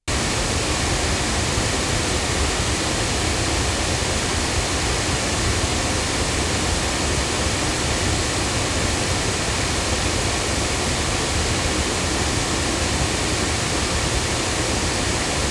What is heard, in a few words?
Royer R-121 back loudspeakers